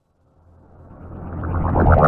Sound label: Fawn Swell; KJ Sawka - Drum n Bass & Beyond; Swells